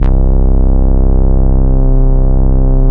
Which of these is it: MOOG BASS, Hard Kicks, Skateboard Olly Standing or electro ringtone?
MOOG BASS